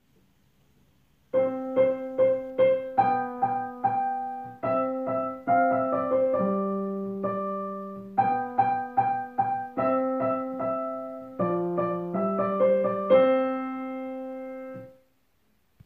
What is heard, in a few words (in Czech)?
klavir Presto C dur; D. G. Turk